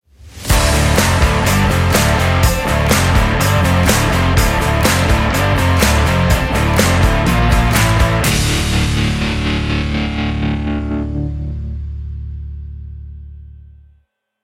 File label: Groovy Rock, ringtone